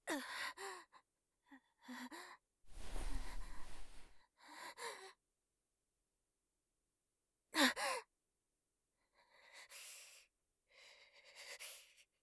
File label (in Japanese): 衰弱